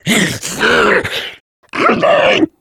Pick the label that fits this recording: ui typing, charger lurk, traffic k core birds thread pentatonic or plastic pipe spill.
charger lurk